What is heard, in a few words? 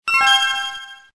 completion; monkey2; PoolMod; billiards